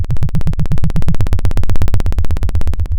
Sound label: Sound of a plane taking off